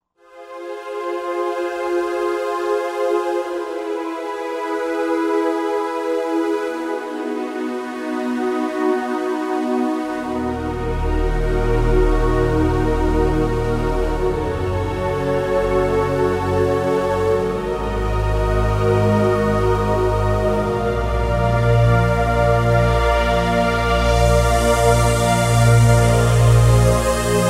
Silicon Str, Roland JV-2080

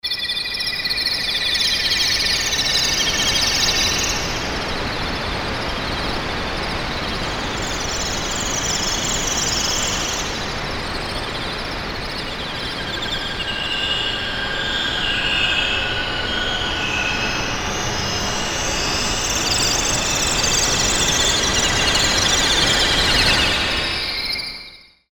Tropical Forest

